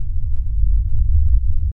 earth quake

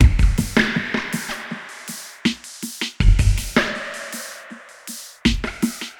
drum loop, ambient, 160; eJay

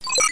Sound Beep

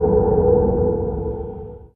Attunement Gain; kult

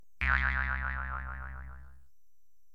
letter up

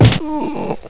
ravine

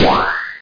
Teleport; Marblelous2

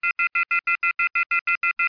offhook; 2000 Sound Effects

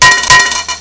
coin out; pvpoker

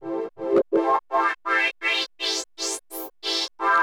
SYNTHPAD HOUSE 125 A; eJay; Sphere

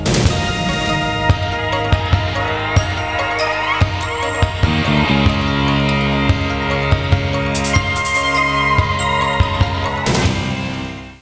Intro Theme; Apeiron 1.0.2

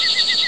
Enter Ambient; VOODOO

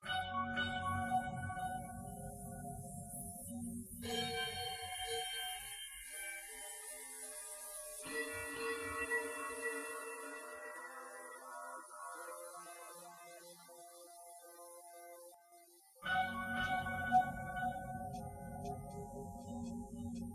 zen desert

